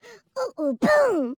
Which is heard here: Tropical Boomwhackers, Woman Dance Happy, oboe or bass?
Woman Dance Happy